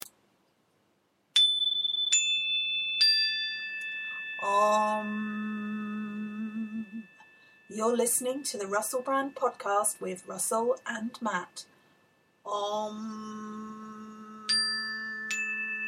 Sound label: podcast jingle